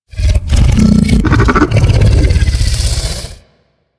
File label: Vocal Captured Idle, critter; Warframe; Hunting Critters; Undazoa